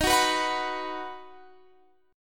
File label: D sharp Minor Sharp 5th D sharp, Guitar